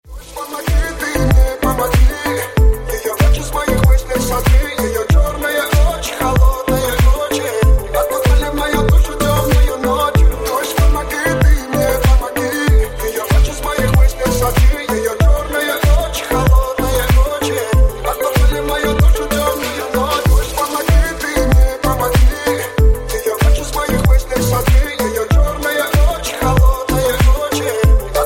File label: remix